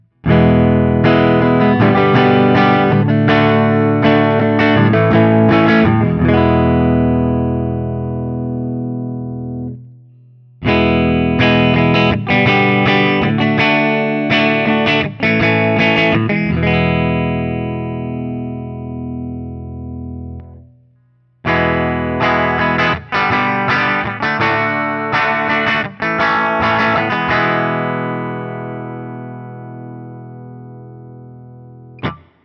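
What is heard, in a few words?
Guild T Bird ST 90 ODS100 Open, guitar; P90; Guild T-Bird ST P90 in Pelham Blue from the Newark St. collection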